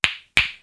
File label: fire on